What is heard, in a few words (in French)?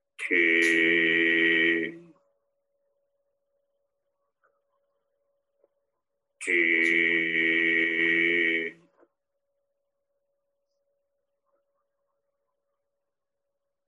sons foie